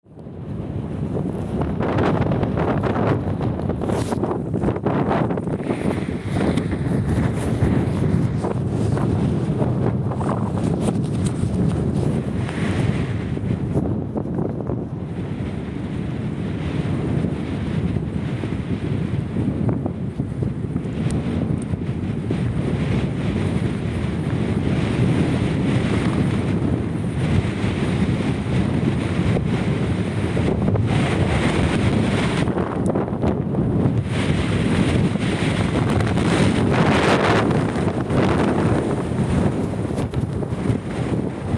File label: Cycling and walking during Storm Malik Howling whistling sounds under a raincoat and flapp; Howling whistling sounds under a raincoat and flapping sounds